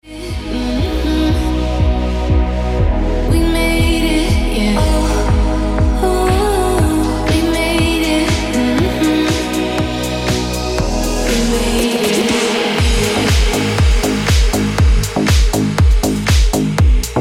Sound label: Ringtone Deep House Music